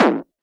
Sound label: hard bass drumz